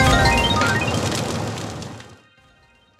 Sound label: big win frames; games; Fenix Play 27 Deluxe; Wazdan